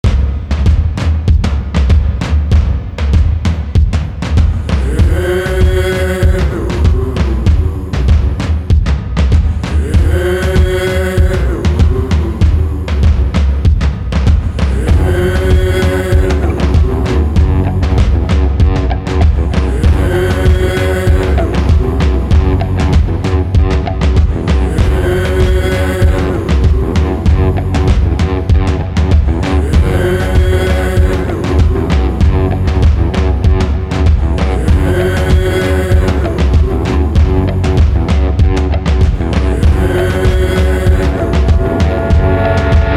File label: Epic motivational indie rock; Mantra Studio